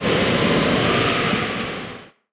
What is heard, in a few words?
tornado